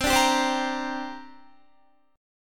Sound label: C 7th Suspended 2nd, Guitar; C7sus2 strummed